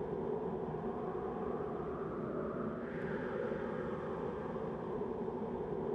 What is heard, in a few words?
Outside wind; zps; Ambient